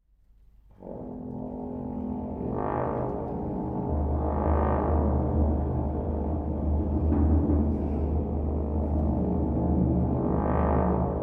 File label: For Piano and Orchestra